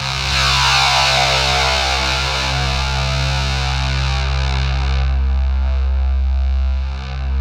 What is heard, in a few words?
ZWEEP; Spectrasonic Distorted Reality 1